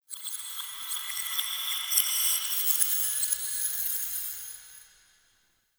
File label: Zariman Metal Whispers; Warframe; The New War